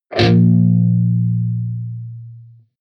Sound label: electric guitar distorted powerchord low muted